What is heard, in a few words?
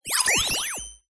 science fiction computer tone fm activated or similar; zapsplat